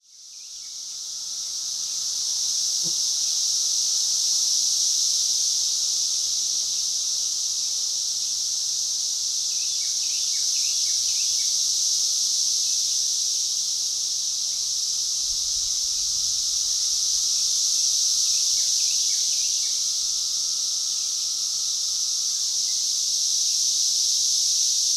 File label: cicadas